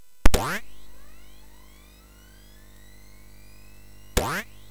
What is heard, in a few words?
Sound Fx, MOS6581; hvsc